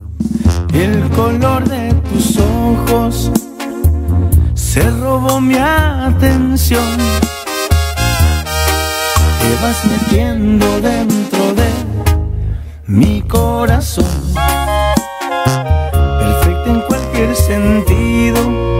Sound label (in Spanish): banda sms